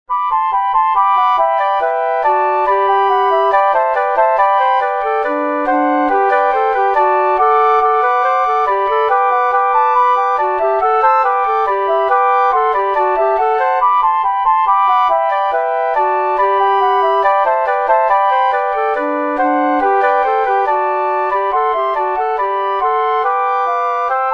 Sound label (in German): Froehliche Weihnacht Querfloete Trio